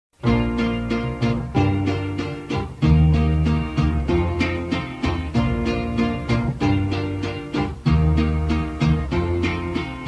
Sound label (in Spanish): Aleluya Mariachi Misa panamericana